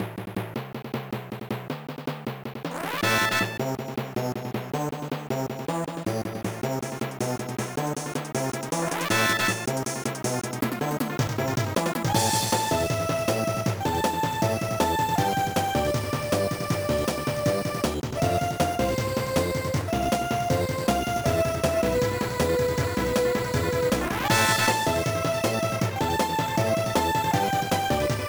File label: Vocal Volcano; Kirby Squeak Squad